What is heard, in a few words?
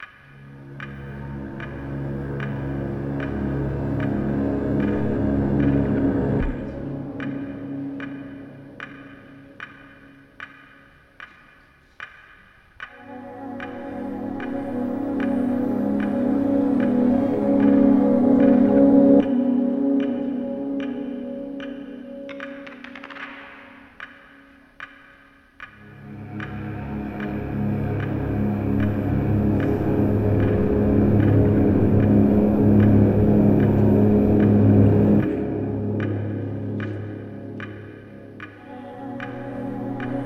Heartbeats